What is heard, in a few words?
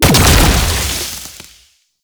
Item Player Explosion; Safe Zone; Gameplay; Warframe; Nineteeen Ninety Nine; Deep Archimedia